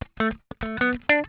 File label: Funk Guitar; Zero G - Funk Guitar